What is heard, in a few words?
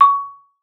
MARM C#6, MARIMBA; Xsample VOL-4